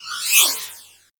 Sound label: Swarm Cannon Projectile By; Warframe; Weapons; Corpus